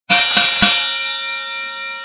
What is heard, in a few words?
boxing; XNote Stopwatch; Cronometro